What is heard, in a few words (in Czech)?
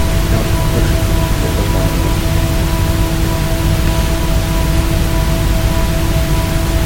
vox angelika, englerovy varhany